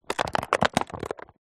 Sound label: foley plastic cube packet movements; zapsplat